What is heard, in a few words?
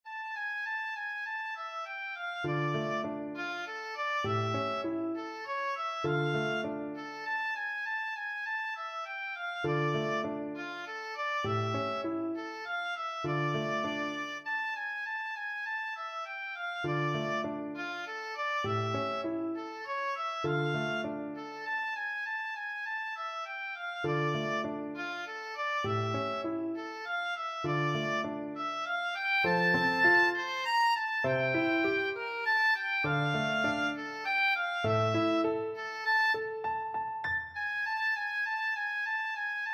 beethoven fur elise, oboe